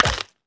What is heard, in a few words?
gator melee; crocs